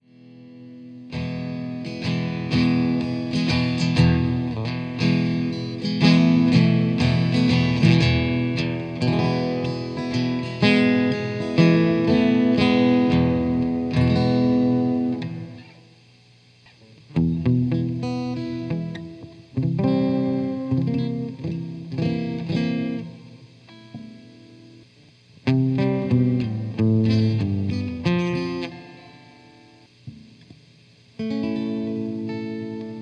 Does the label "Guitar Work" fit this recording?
Yes